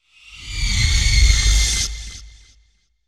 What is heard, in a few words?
Iron screech and rumbling sound